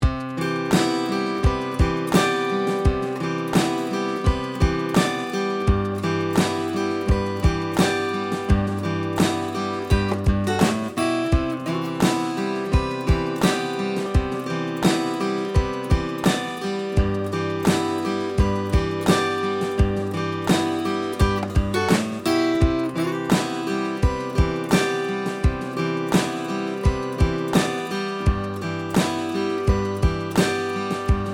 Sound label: zoom UAC 2 SM58